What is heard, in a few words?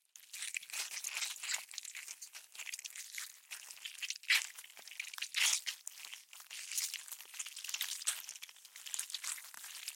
Glue Sound; Zomboid; DIY VehicleParts